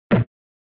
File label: SNES Fighting Defend